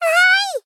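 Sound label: Moe, Glad, "Waai", Japanese female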